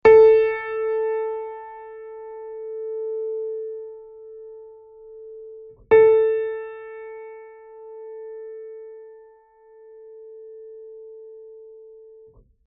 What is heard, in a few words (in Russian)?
Камертон Пианино ля